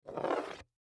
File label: Aerosol Can Pick Up From Counter; Foley